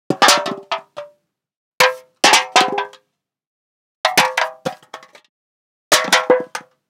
Aluminum Can Drop On Floor; Foley; Mechanical Wave; Foley Session 01; GDC Game Audio Bundle

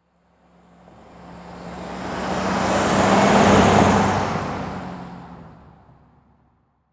Transportation Jeep Commander Drive By Slow

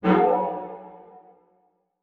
Transition Hit Impact Short; Production Element

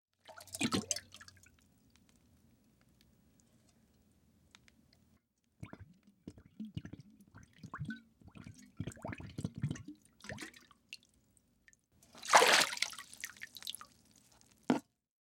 Liquid Water Canteen Fill

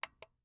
Foley Telephone Speaker Box Pushing Button

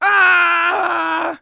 Horror Scary Human Male Scream Voice

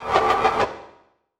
Title Transition Delay Short

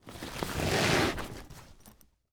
Sports Bag Duffle Bag Lift Ground

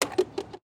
Industry Gas Pump Removal

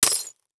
Impact Glass Debris Hit By Copper Pole

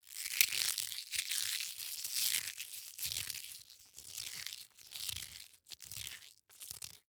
Horror Scary Bug Creatures Movements

